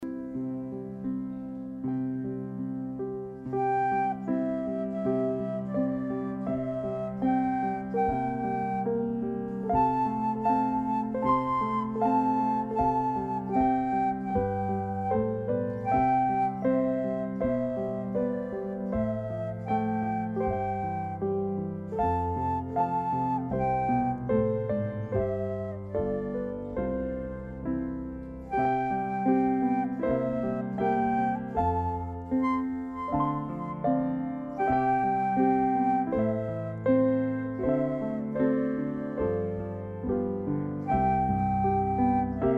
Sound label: instrumental